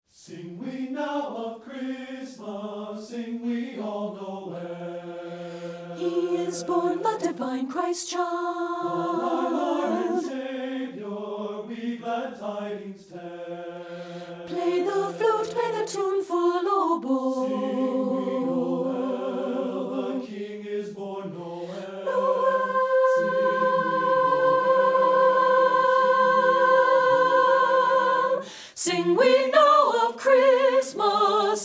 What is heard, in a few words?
ALTO; satb